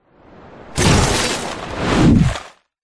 explosion designed massive impact smash glass debris fast shockwave; zapsplat